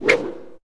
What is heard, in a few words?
no hit